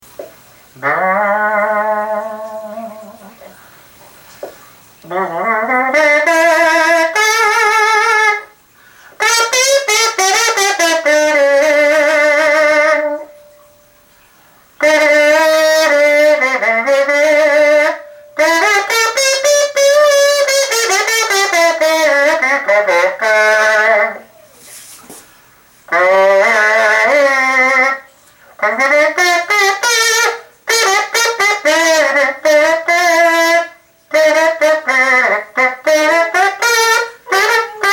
Electric Kazoo

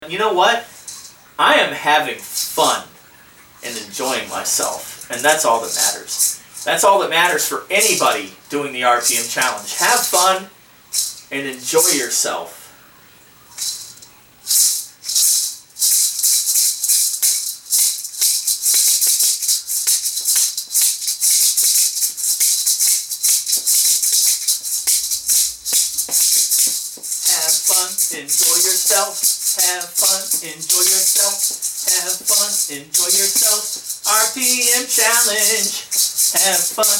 Maracas

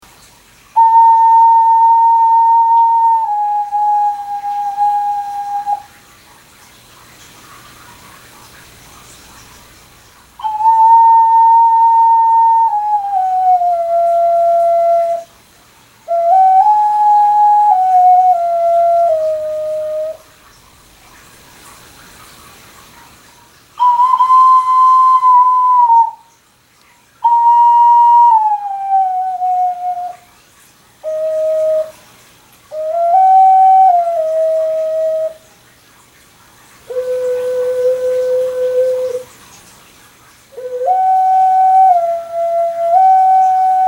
Ocarina

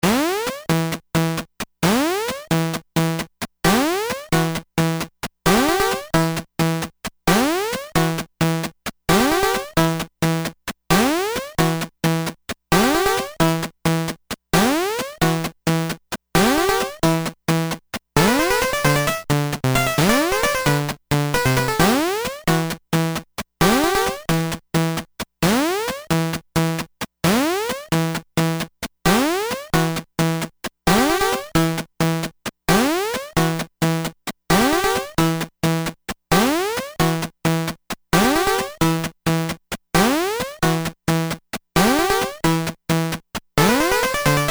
Digital Groove

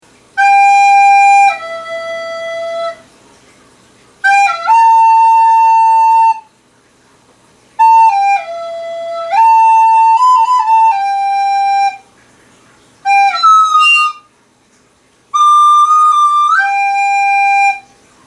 Recorder

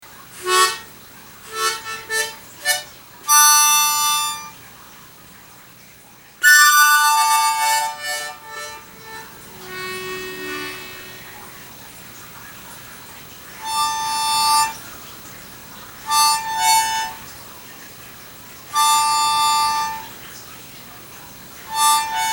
Harmonica, Live Performance

